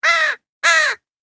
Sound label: Amb Animals; Red Orchestra Ostfront 41-45